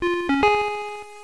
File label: bonus; Games.Rick Dangerous